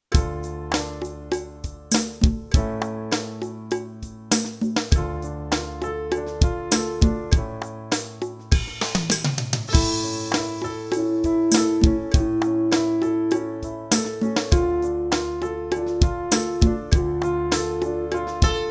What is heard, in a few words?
F low, mid Bb, brass